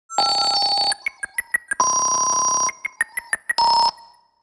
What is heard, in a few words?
Futuristic data calculations medium